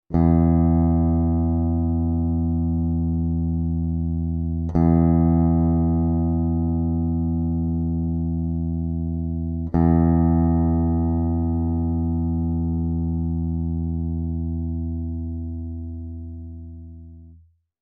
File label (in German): Stimmton E